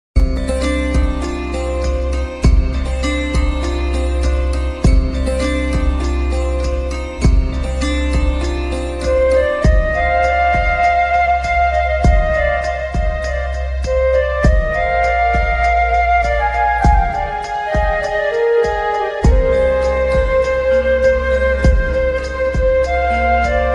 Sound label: flute sad; murder2